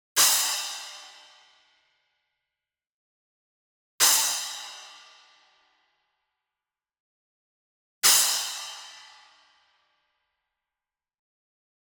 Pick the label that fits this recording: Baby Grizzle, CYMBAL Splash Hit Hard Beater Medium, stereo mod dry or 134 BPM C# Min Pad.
CYMBAL Splash Hit Hard Beater Medium